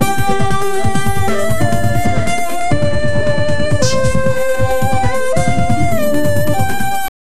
80s vaporwave comercial j; commercial

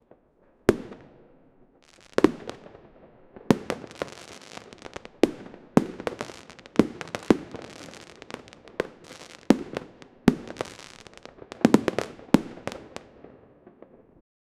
nuke fireworks; celebrations

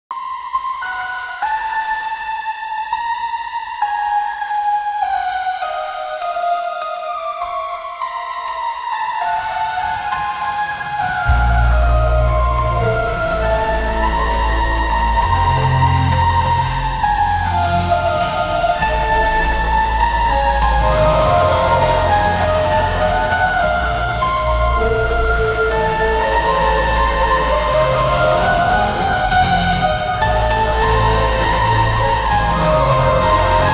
sci-fi song with a scary; horror

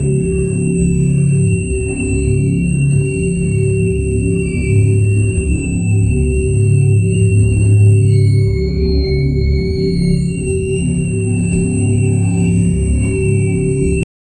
i need a sci fi portal

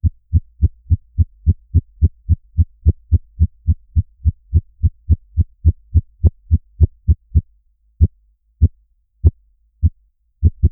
heartbeat with accelerating

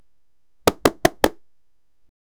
black hole punch

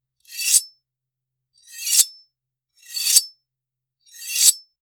blade being drawn slowly

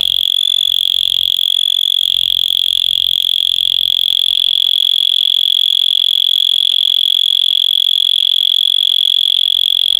out of time sound, error

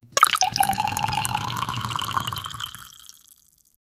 create a sound effect of, fountain

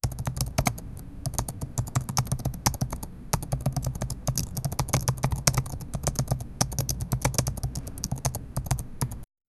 generate ambient office, corporate

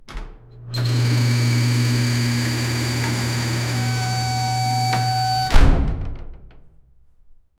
heavy hydraulic door; long; building

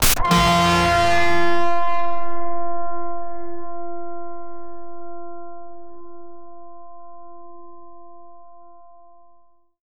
edm lazer edm pluck; electronic music

